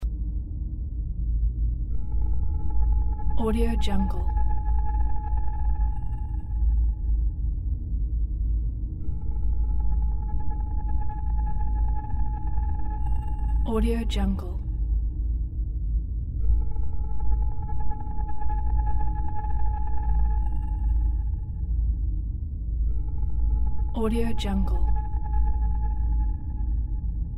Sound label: alien spaceship ambience inside